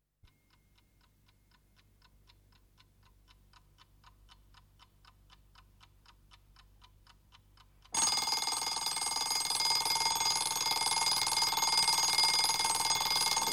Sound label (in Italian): SVEGLIA DA TAVOLO